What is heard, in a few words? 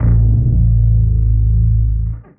one deep guitar bass note; Basses